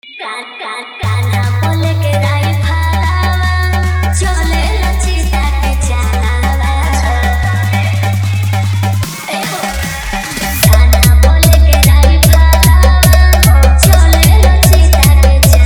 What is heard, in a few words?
Bhojpuri Dj Remix Songs Vibration EDM DROP Mix